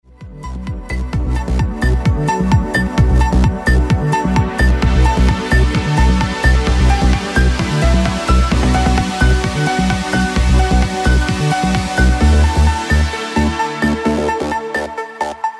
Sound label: dance music